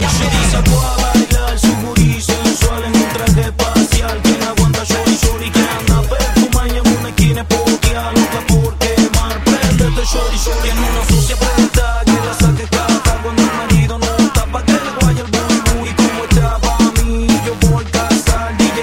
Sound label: raggaeton remix